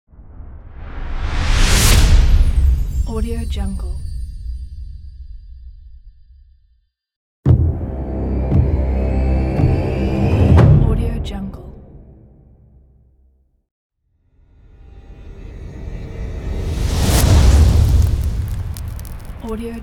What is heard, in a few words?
trailer set